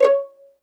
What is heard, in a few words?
C#5 PIZZ, Violin; Q Up Arts; Denny Jaeger Master Violin Library; PERFORMANCE